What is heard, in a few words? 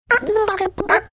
marsupial gurgle choking sound mixed